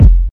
tight kick drum one shot F key, Royality free kick drum sound tuned to the F note; kick drum samples